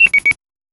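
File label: clicking ui button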